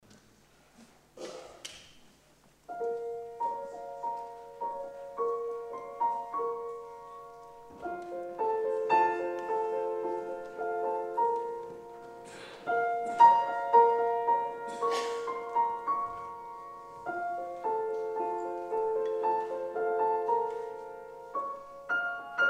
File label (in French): improvisation; oriental mazal